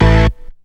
DIRT HIT, STAB; SoundFactory; SMPMANIA

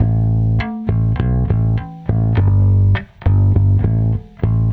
Electric Bass; Basses

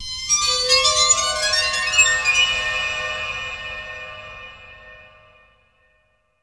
noise; SoundFactory; FX

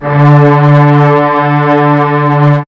FAT BASS; SoundFactory

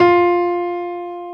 yamaha grand piano

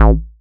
Synth Bass Single Note; Basses

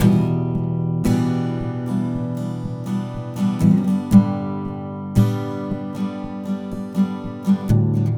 Acoustic Guitar; Guitars

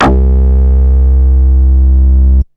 BASS; SoundFactory; SMPMANIA

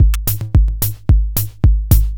Dance Drumloop; Drums; Dance Beats

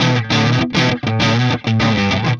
Electric Guitar, Loops; Guitars

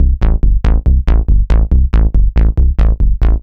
Synth Bass; Basses

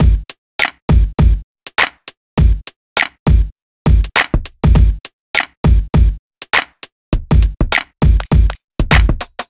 Urban Beat, Drums

